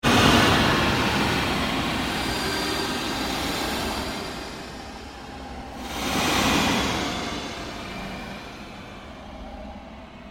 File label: Created Pulse Sweep, Sweeper Sound Effects; Sound effects created by manipulating other sounds